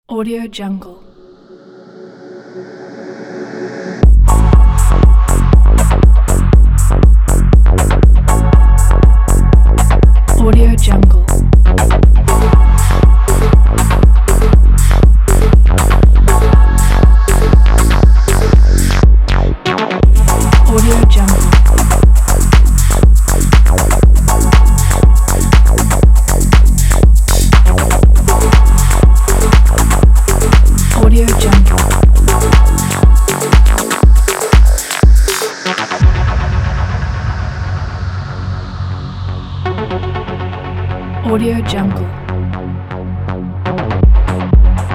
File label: fashion techno